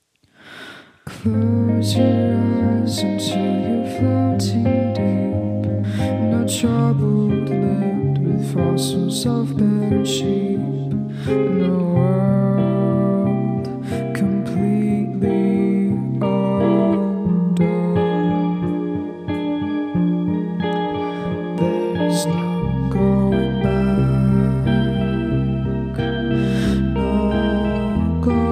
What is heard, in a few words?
Lullaby